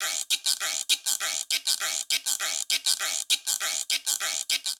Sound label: Charanga Guiro 200bpm; Latin Percussion; Ableton; Factory Packs